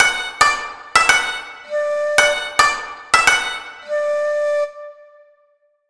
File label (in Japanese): 和ジングル